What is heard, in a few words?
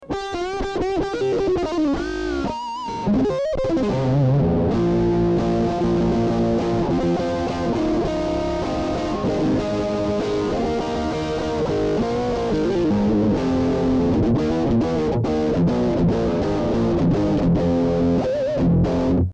LOWER MID ROCK GAIN DIRTY